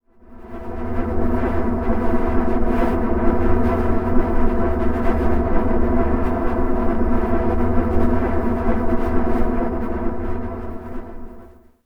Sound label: Pillar Drone E; Zero-G Seismic Frequencies; Ancient Drones; 90 s Sample CDs